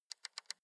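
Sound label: sports car interior volume button spin soft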